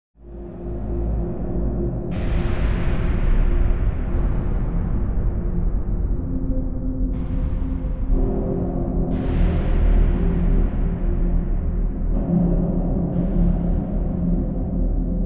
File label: Ground Noises Soundscape